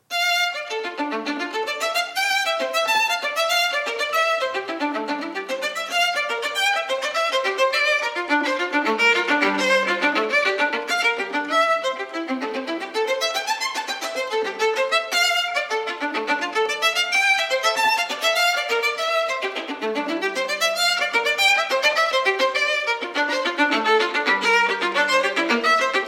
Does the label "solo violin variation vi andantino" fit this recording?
Yes